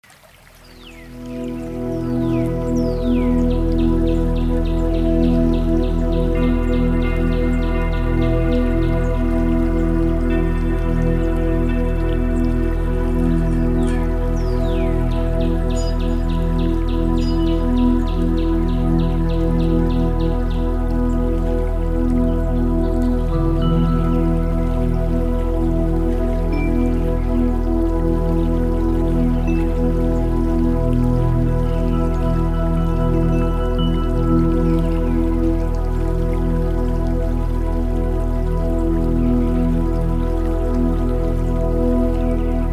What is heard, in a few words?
rainforest